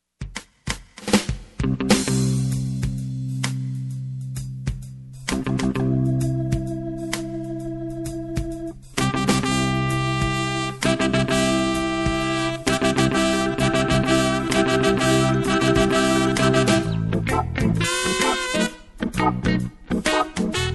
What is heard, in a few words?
Reggae Wedding March; contemporary wedding songs